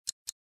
second hand, ui; Infinix Hot 12 Play

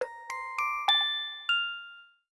Unobtrusive; Vivo X5 Pro; notifications

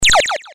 8-Bit, Shooter Message; HTC; Sound Sets

